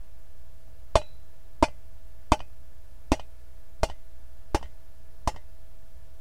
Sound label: Chopping Wood; Sound Explorer